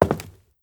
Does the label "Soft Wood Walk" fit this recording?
Yes